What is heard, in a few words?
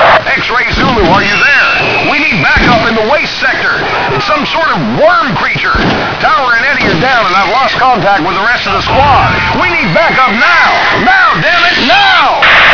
radio; kabul